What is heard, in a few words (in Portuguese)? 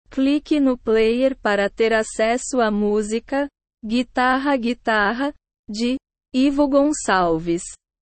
guitarra guitarra